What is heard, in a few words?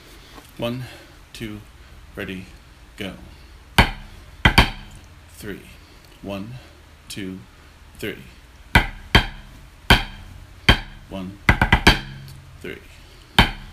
Tenor; KOMC Competition Scores; JimThomson